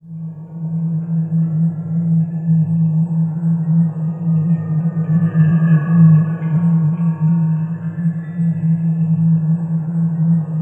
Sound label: ATMOPAD; Best Service; XX Large; Pads